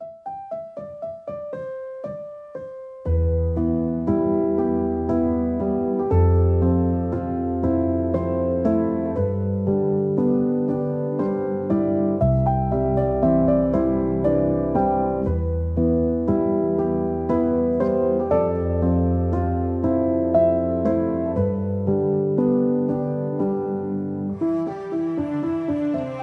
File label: bgmusic